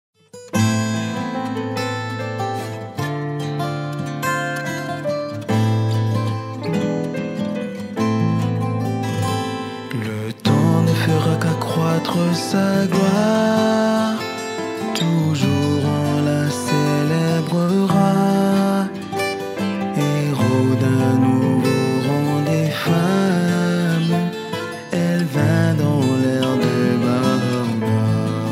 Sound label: Song, FR